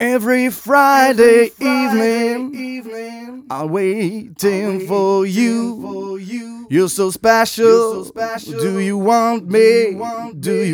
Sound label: 130 mel g, Vocals